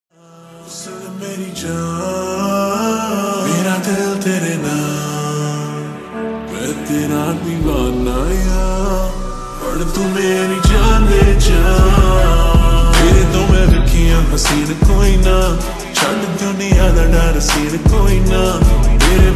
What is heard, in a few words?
Slowed Reverb Ringtone